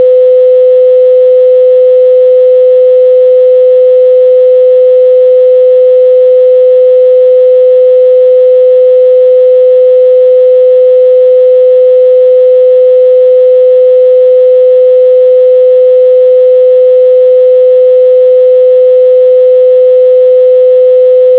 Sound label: dial Max Amplitude; Telsa Recorder Server; ivr